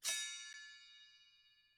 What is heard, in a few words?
bell tree wood scrape; Idiophones; Struck Idiophones